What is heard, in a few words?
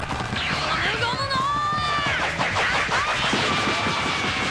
Gatling Gun; Gomu2 No